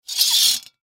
Shower Curtain Pull Open Metal Rod Movement Slide; Bathroom; Household; Blastwave

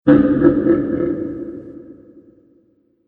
Monster Deep Evil Laugh; Monster Sound Effects

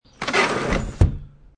Slide Door Close, Sliding door closes.; Part of Sliding Doors (Sound Effects:Doors)

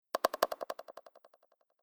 Short Clicking Tap Rhythm; Cartoon / Game; Andrew Fleming

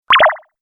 Laser Switch Button

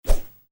Woosh, Whoosh: Short, Light; Whooshes; Sound Design

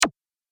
Game App Hit; Video Game Sound Effects

